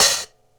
Coo Hi-Hat; KJ Sawka; Drum n Bass & Beyond; Drum Tool Box

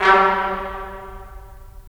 BRS, Tps Stacc; Roland - LA Composer vol. 4

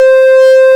SYN, SYN Analog

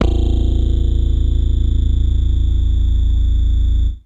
Electric Bass, FAIRLIGHT; Best Service ProSamples vol.55 - Retro Sampler

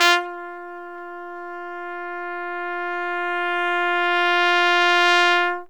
CRES. TRUMPET, Pop & Funk Brass; Best Service ProSamples vol.25; AKAI

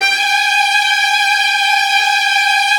TRILLS, Virtuoso Strings; G3; ILIO; Kirk Hunter Virtuoso Strings VOL-1

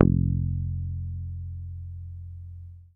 HOF SUS F1, Hofner bass; Sampleheads; Will Lee Bass Library

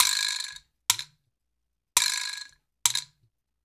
Vibrottles

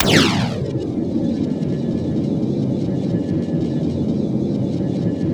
MISSILE, WARHEADS; Spectrasonic Distorted Reality 1; WARHEADS 2

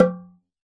OXACA DRUM; Synclavier Percussion; World & Orchestral; ILIO

